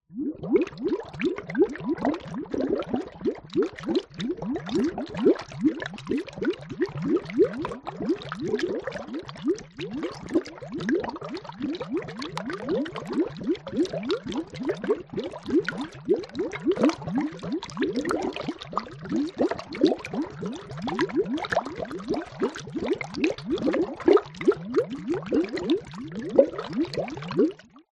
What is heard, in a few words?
horror cauldron bubbling; zapsplat